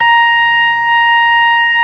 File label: ORG B3 A#5; Best Service Gigapack 2